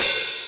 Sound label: OP Hihat; Open Hihats